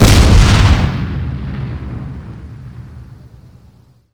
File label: turret aa missile explosion